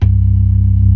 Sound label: CLASSIC C3, Synth and Organ